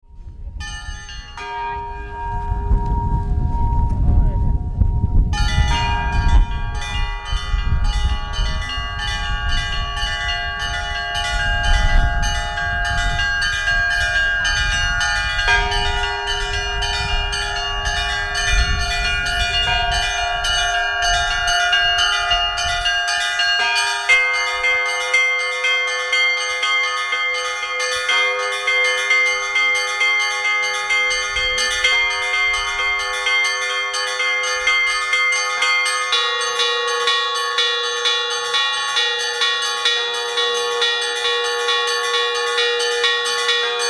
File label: russian bell